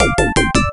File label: Miss; Ninja Kid II; arcade